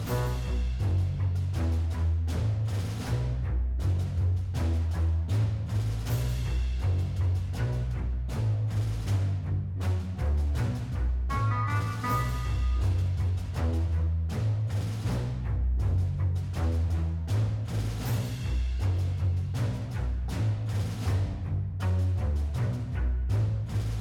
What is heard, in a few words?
Dreadwood Boss B 160 Low